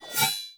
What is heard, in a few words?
Bayonet Melee Whoosh Neutral Accent; Warframe; Tenno